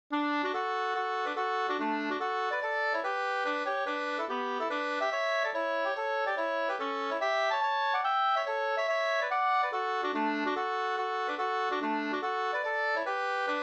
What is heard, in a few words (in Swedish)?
mazurka; Gubbstot Nr2 e Sigfrid Hellberg Sd 2st